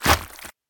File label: Enemy Boss Ground Hit; Smilinguido